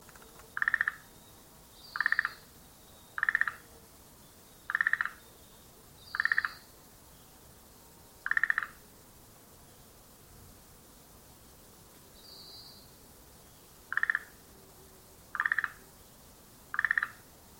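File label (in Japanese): shuregeru, Schlegel's green tree frog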